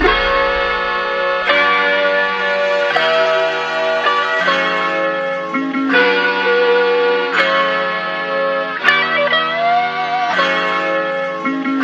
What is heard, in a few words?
guitar, Loops